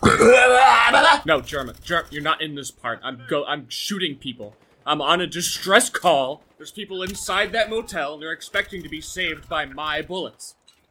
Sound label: Burp; Jerma